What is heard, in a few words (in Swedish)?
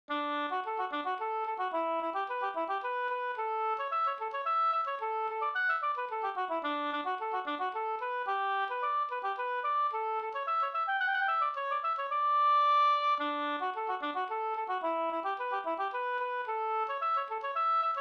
polska Bingsjopolska; Polska mot trotthet av Viksta-Lasse